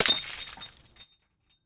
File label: bottle smash; Zomboid 41.78.16; Project Zomboid